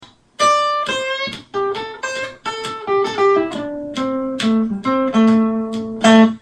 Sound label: riff for the 2nd pentatonic position box 2 lick